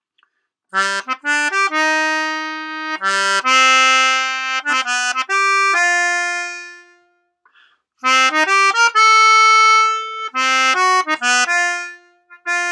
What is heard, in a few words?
VB300 1 reed